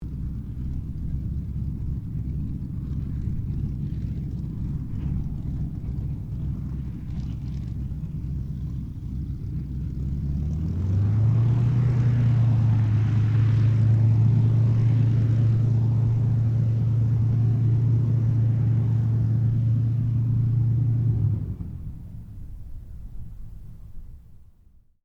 AIRCRAFT SUPERMARINE SPITFIRE MK-XVI TAXI SHUTDOWN DISTANT STEREO; Airplanes